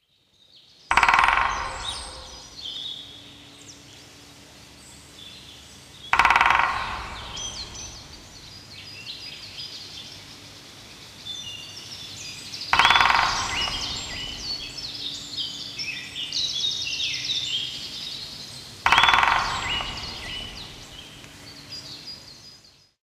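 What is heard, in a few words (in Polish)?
dzięcioł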